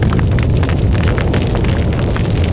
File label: quake; XDM; ambience